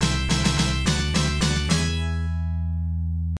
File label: Lavapit Win